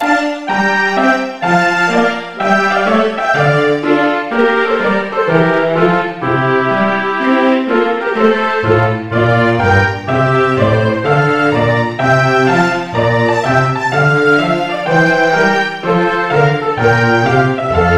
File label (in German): feuerwerksmusik bourre i bourre ii